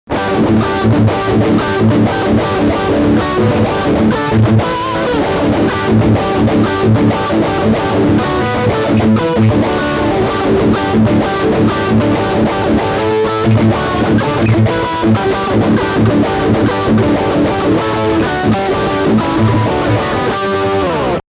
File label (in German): Riff Steg humbucker